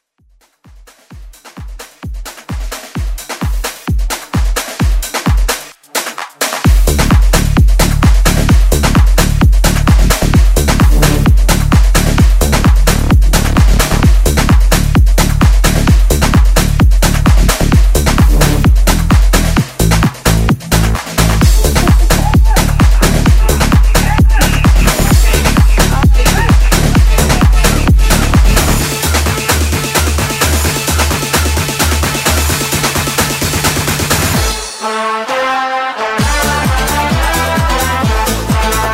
Tribal House Edit

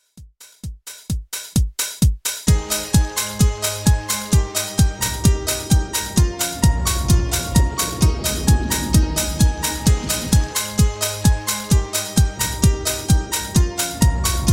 Intro Outro Clean 130 Bpm

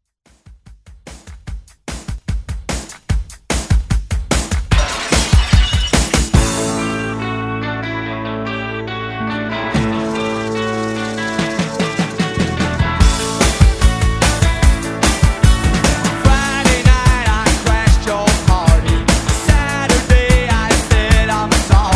80s Classic Rock Redrum

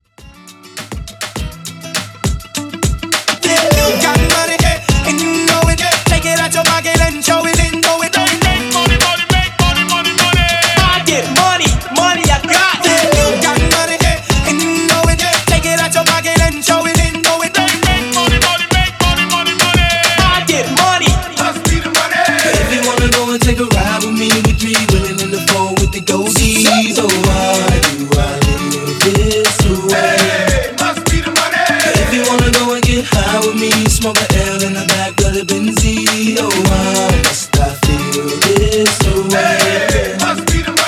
Intro Refresh 102 BPM; remixplanet

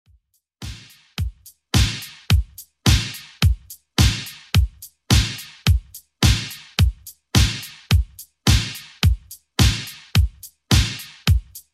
80s Italo Mixshow Intro, 107 Bpm

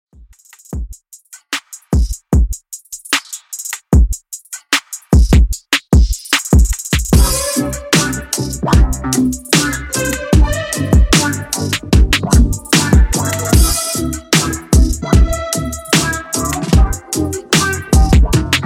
RB Redrum 150Bpm

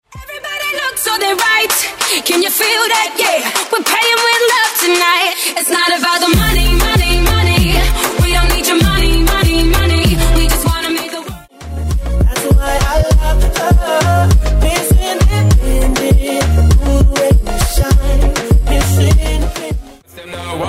Hip Hop Pop RNB Moombahton Megamix

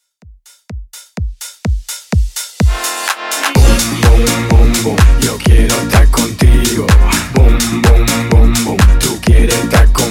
Djs In Out Clean 126 Bpm

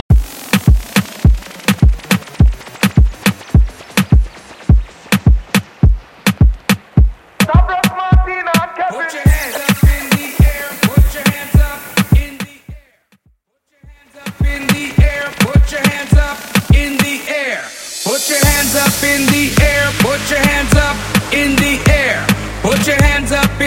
80s Bootleg Party Break Transition; Mainstream VIP; Vegas Edit

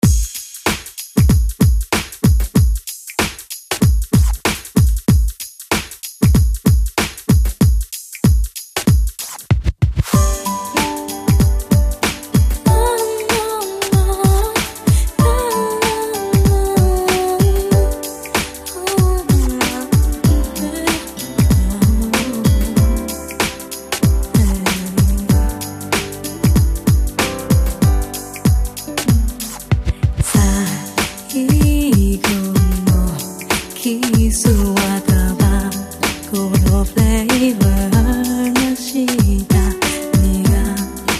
first love funky breaker 95 bpm

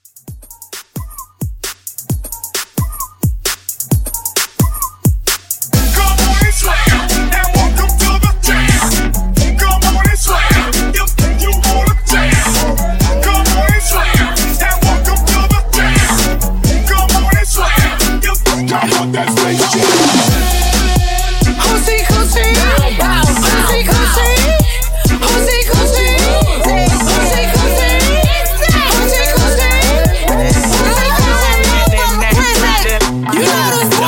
Dance

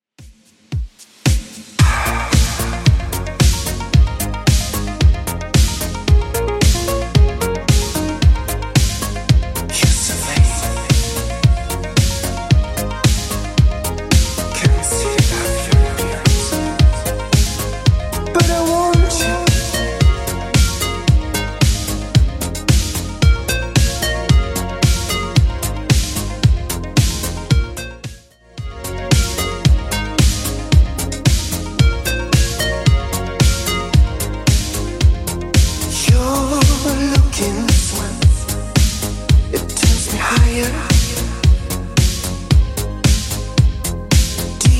80s Redrum 112bpm

